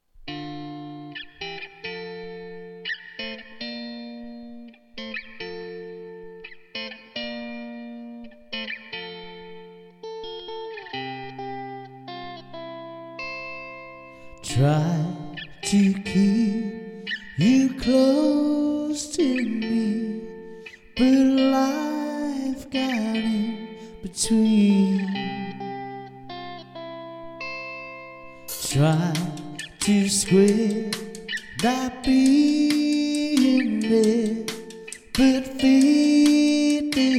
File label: vocal